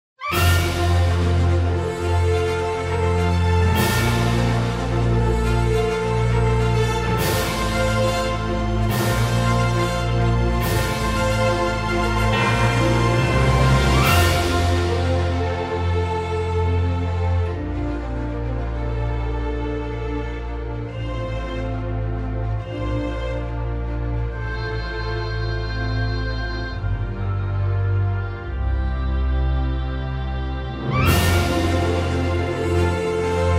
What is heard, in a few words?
Orchestral Arrangement; Wind Waker